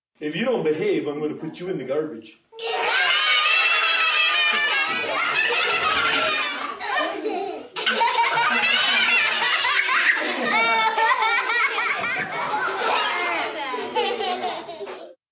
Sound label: Group Clown, Laugh, Group, 10-12 young children, laughing at clown, screaming, yelling; some individual laughing, kids, males, females, girls, boys; Voice Box